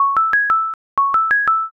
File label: mobile ring